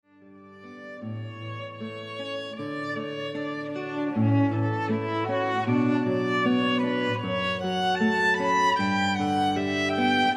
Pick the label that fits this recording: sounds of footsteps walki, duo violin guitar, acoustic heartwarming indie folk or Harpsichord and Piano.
duo violin guitar